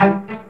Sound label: PIZZ G, Strings